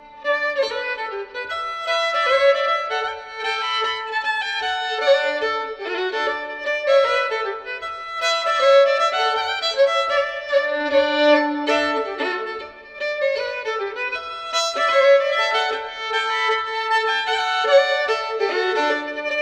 Reinlender; efter Helmer Kjolvang

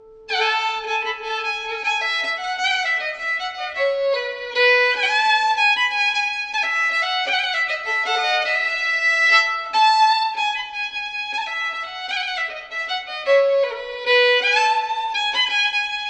vals; Hals Lars

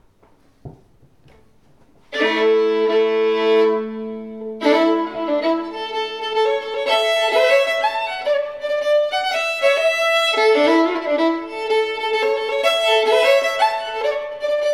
A dur polskan; Sarna

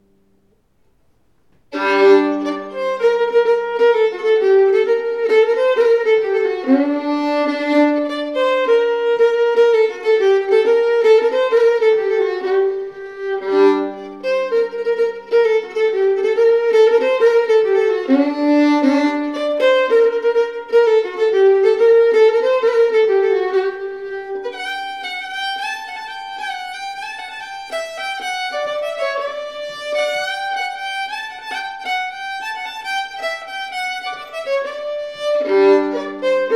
G-moll polsk, Engerdal; efter Helmer

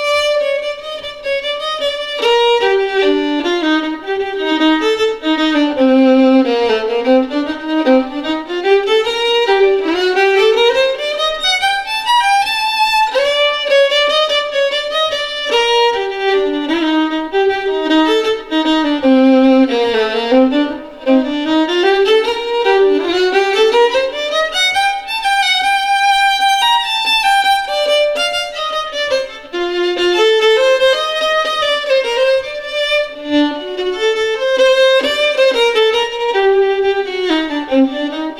Schottis; Schottis till Mockasinerna

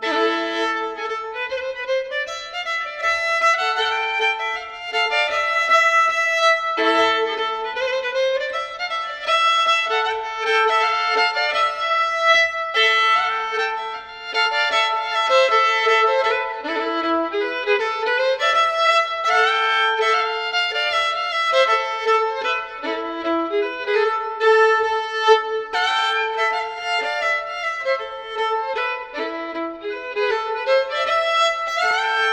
Reinlender; efter Helmer Kjolvang

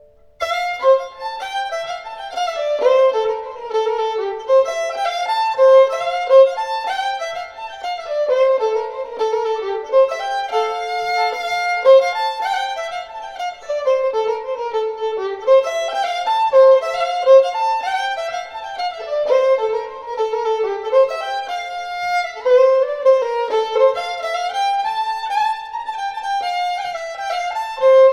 Stamplat Harjedalen

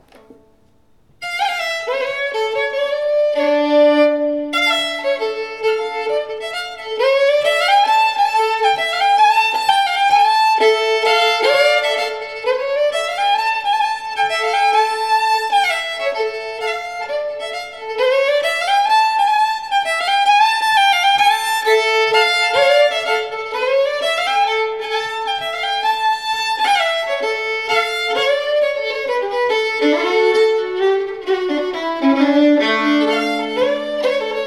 polska Sarna; Spakens

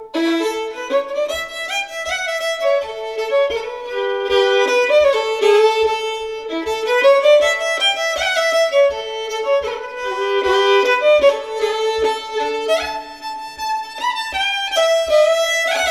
Reinlender; Griffenfeldt

